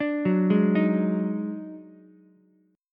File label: D sharp Minor Major 7th Double Flat 5th D sharp Ukulele; arpeggiated